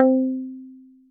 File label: Pick Bass, Plucked; Instruments; Ableton; Synth Essentials; Tension